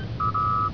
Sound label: beeps; Trek